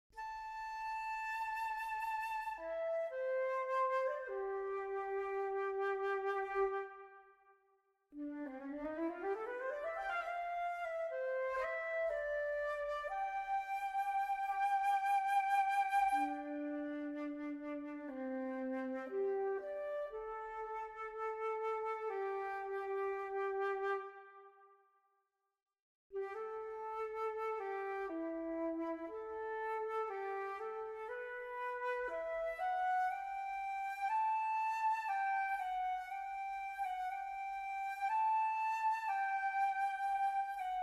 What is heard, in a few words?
Flute; Svetlo Sveta